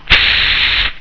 STATIC, CLICKS

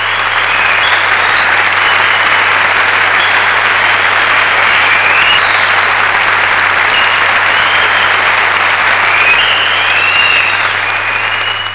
APPLAUS

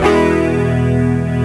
E MAJ STRU

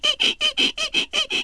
BED SQK, CARTOON

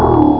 BWANG, CARTOON

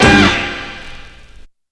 SHOT

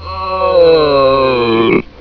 robot; Gloom3 rejects

